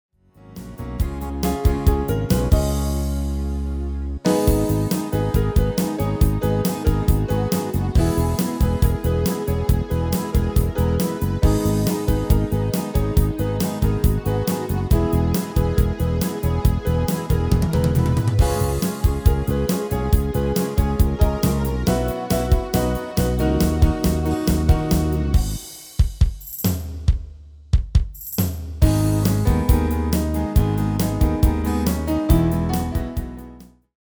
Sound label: MIDI